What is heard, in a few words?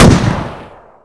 auto; AssaultCube; weapon